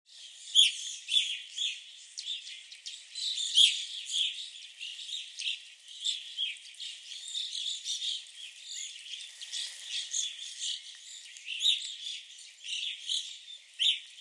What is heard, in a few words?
Evening Grosbeaks at the Sax-Zim Bog Visitor Center